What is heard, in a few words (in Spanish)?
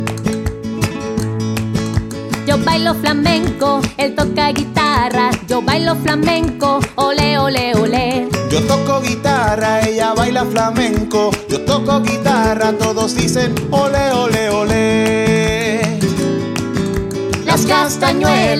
sonidos del flamenco; ¡BUENOS DÍAS!